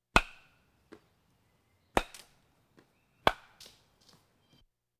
Crowds Race cars Baseball

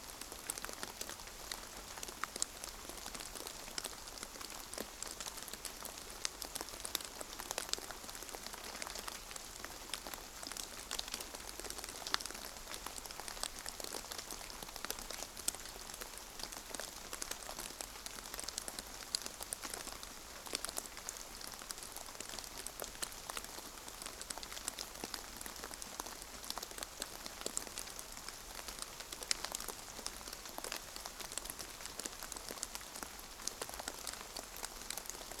Rain light patters aftermath, Thunder And Rain; boom library

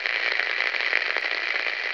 Radio Static Loop; SFX Kit; Foley - Misc

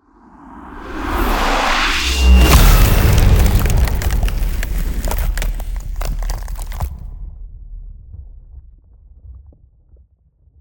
ice spell ultimate; Ultimate Ice Spells; Glassial Catastrophe; Arctic Aura

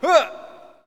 Hockey Male Hurt; Hockey Vocal Sounds

